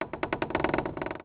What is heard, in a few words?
wood creak; Ambient; MX vs ATV Unleashed